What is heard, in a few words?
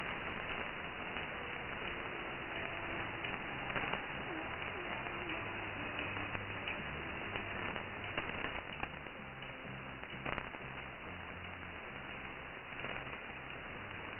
1655khz Free Radio Station Nederland